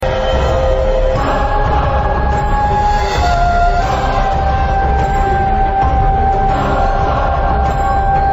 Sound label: Dramatic Low